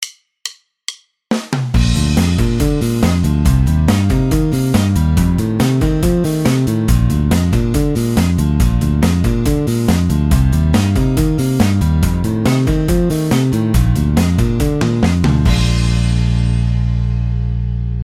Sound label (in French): arpeges exercice, basse; exercices